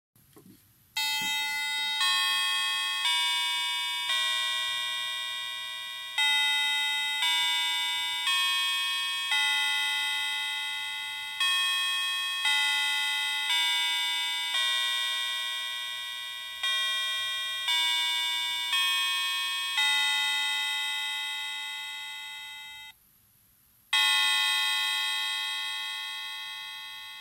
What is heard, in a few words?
westminster, Zegar scienny z dzwiekiem Big Ben